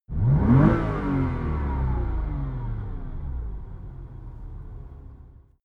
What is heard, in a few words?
sports car porsche boxster rev single interior; verkehr transport; autos